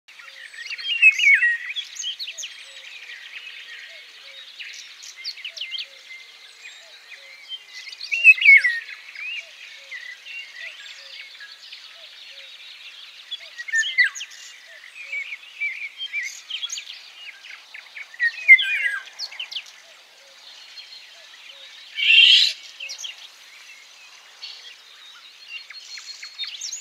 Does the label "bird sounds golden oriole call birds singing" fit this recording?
Yes